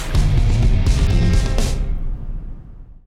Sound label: end background; Ben 10 - Cannonbolt Smash